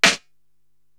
SD Dry Vintage, Korg TR-Rack drum machine

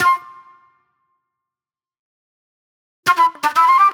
Jammy Flute; Sonic Foundry (Sony Creative Software); Manhattan Flutes; Super Brown

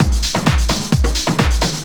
drum 130 tribal, drumloops

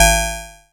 BRIGHT, PERC HITS; Zero-G Ambient 1

